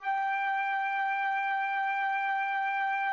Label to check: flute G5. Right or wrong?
Right